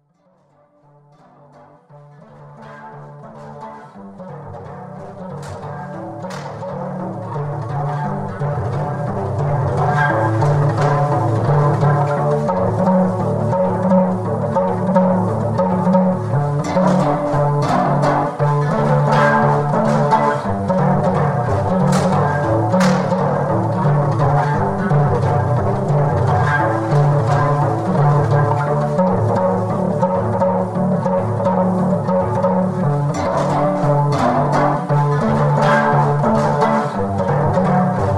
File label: sunset piano